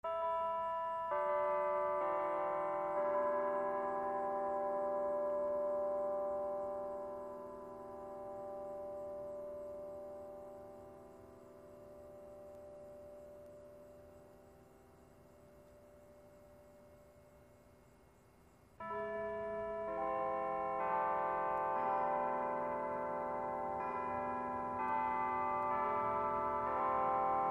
Church Bells Musical

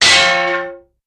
Big Metal Clang thud, impacts

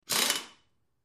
Grinding Air Wrench, air tool

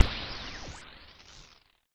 ELECTRO BLADE CANON, lasers

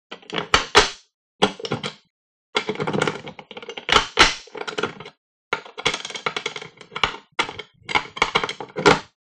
Door Latch Person Lat

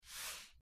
Short Squeaky Mouse

